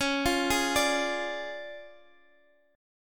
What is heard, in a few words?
D Minor Major 7th Flat 5th, Db